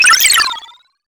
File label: Rabbit